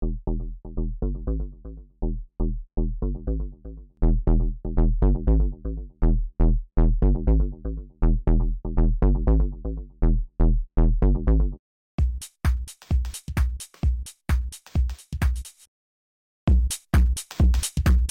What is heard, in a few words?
Sampler Shaper